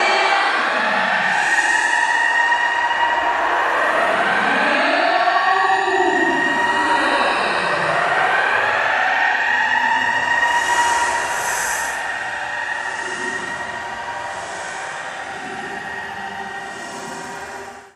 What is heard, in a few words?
alarm stretch